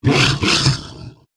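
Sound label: Damage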